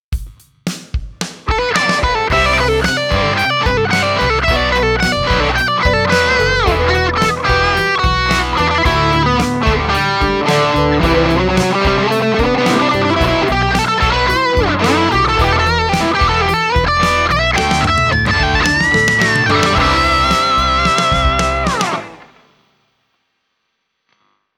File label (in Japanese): ソロ; boss gx1